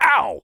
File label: oww; Postal Dude Voice Lines